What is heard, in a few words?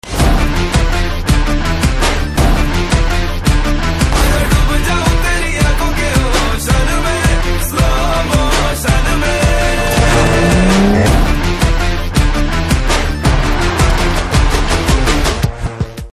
Ringtone; Bharat Movie